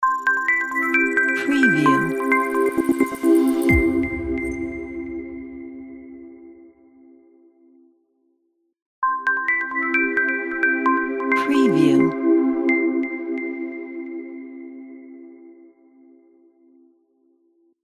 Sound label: ambient tech logo